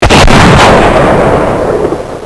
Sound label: fire, weapons